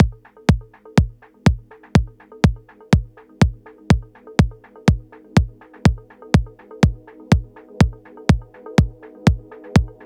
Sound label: Sound Waves